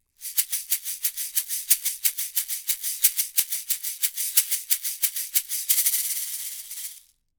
akg c414 xls shaker

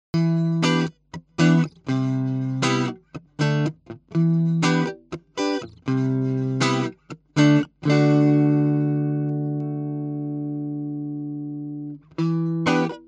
cln

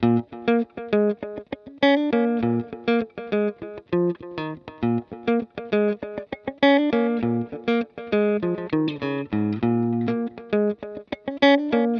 OX Amp Top Box test Fender singlenote delay Vox; universal audio

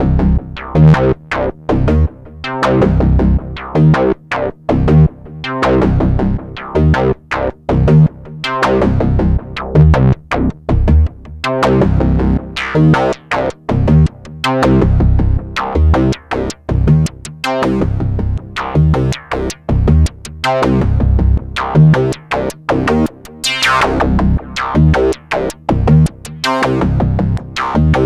Arturia MatrixBrute

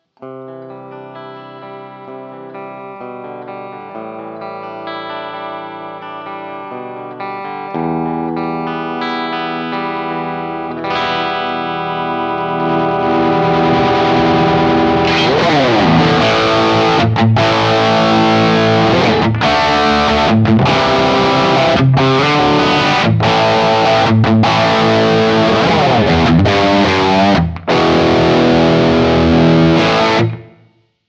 gibson les paul lpm bridge clean to gain

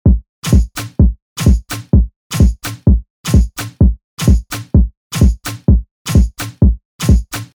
Bpm128 Trippin

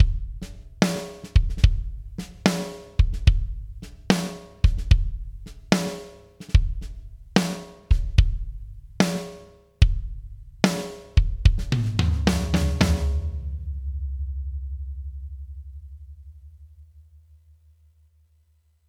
kick snare tom eq comp sanft; dbx 676

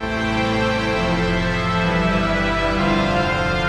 epic lofi strings

